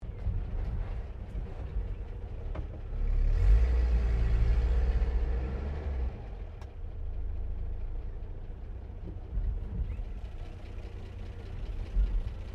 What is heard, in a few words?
CAR HOLDEN FJ TURN MANUEAVER SLOW, Classic Australian cars; Holden Fj 1955; Vehicles